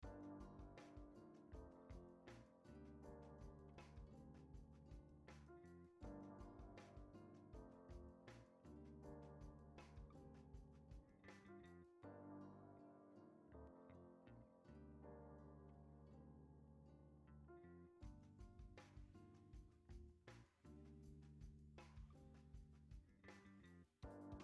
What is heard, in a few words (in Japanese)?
クラシックプロと88760 EQ逆相